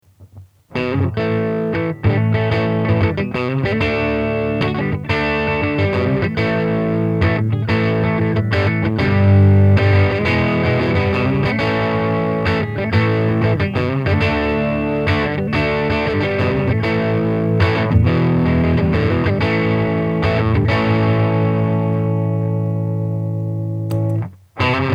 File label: rhythm alive; tc electronic